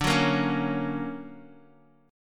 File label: D Augmented Major 7th down Bass Standard; D+M7 strummed; JGuitar